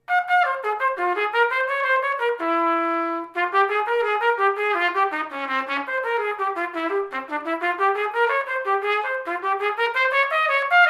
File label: Courante Cello Second Suite, trumpet